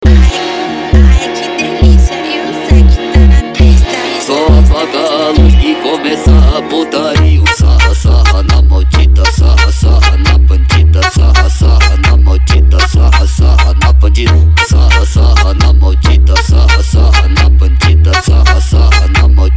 racha eletronica e funk mega bass

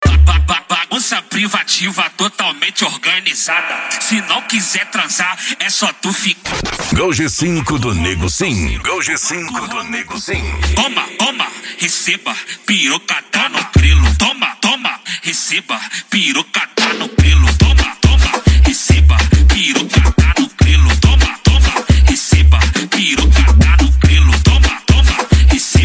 funk mega bass e noia dance

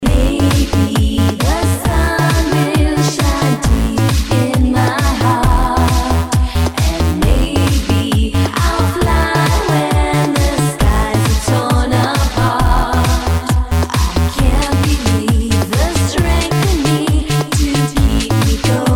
festa dance

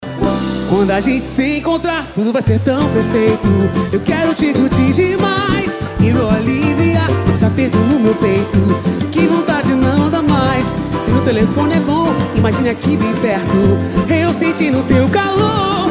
pagode e sertanejo; xtreme sound

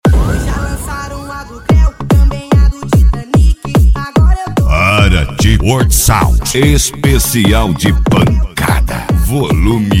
esp pancada

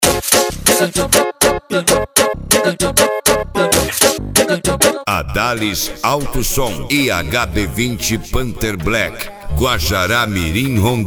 esp de cavalgada

